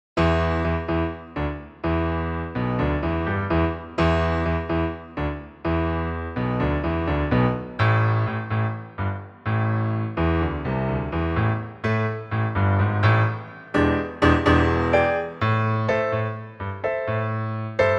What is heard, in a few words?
On Bass